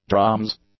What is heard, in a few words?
drums; nouns